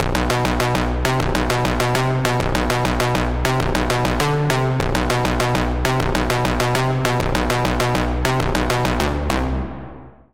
Pluck, Sylenth1; Routed Sound; Sylenth1 Expansion - Doom Carpenter Vol.1

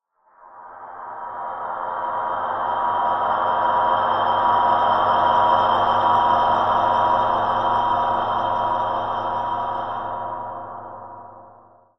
Pad, Sylenth1

